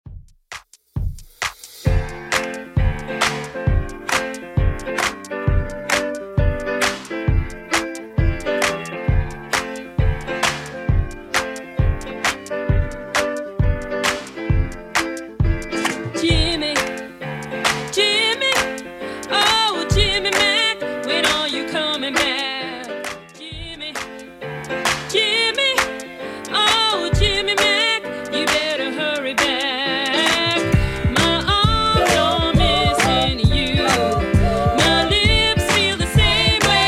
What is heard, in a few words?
70s Redrum 133 bpm